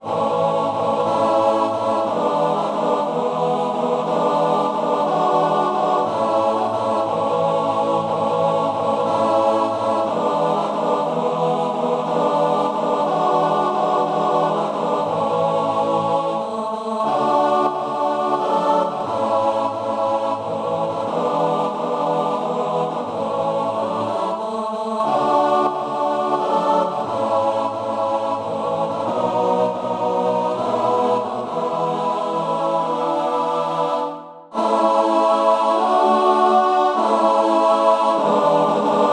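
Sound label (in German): Männerchor vierstimmig; Eres Edition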